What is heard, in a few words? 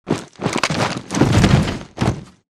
pilot embark casual front; Titanfall 2